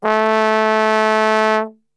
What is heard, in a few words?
A2 BASS TRB, Brass; Best Service; Brass Super Section